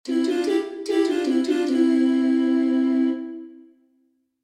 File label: level won